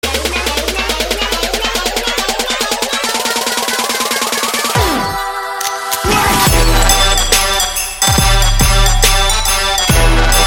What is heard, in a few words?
carol of bells